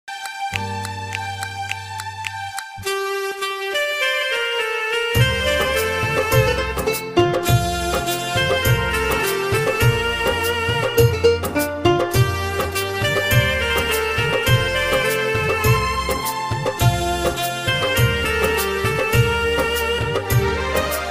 piano ringtone

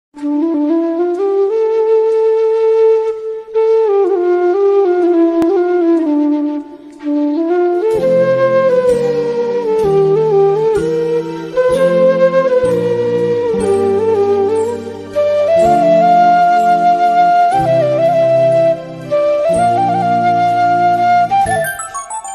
flute bgm

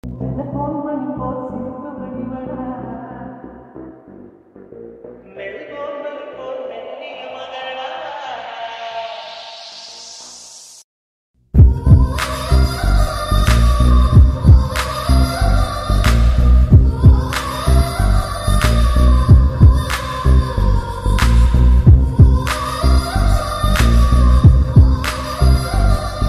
bgm mix

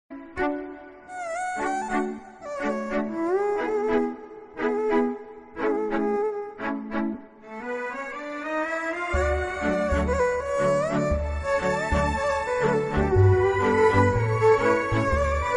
bgm ringtone malayalam ringtone; manjummel boys

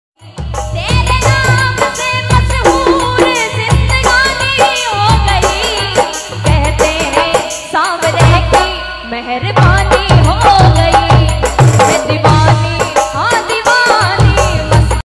bhajan ringtone; sawariya seth

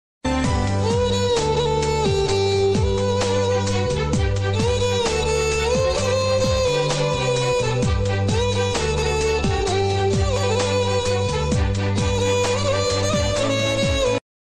instrumental music ringtone

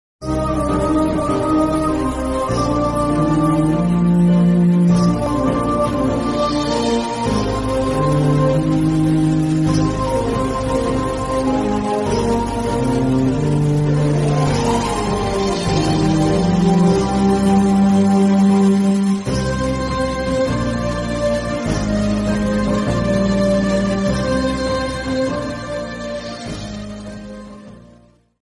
love bgm, heart touching bgm; Orey Bujjiga

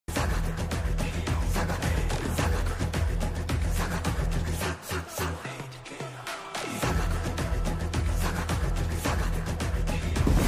title card bgm; ulaga nayagan kamal hassan

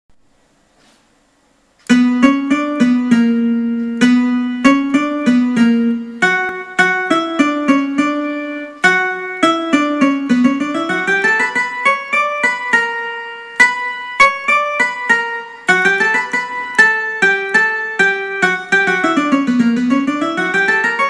song on keyboard

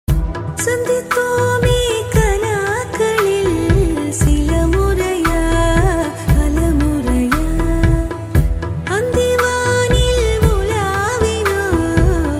tamil love song; Surya; Vaaranam Aayiram

